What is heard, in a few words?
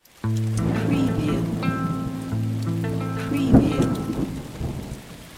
ARP 6-8 Slowrock Am 115bpm Clean, electric guitar; beatcraftpro